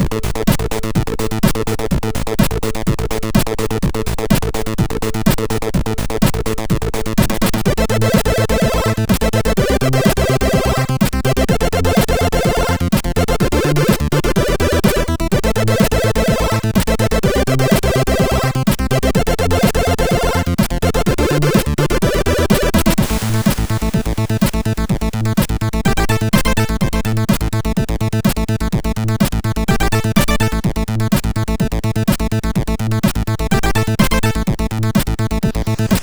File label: tune, sid MOS6581R4; GoatLight; Wiklund